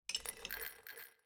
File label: Cup; Jinx